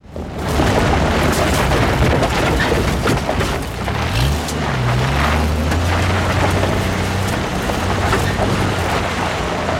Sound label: Car Tires on Gravel With Engine Rev Background